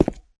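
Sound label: Grunt Movement Footstep Walk Concrete; Titanfall 2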